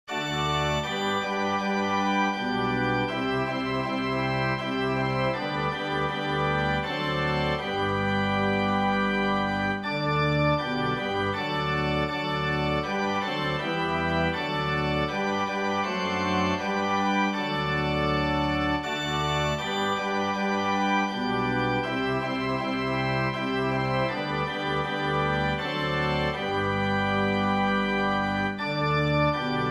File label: o for a thousand tongues organ